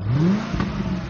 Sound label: vrooomm